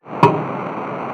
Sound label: Hammering a comet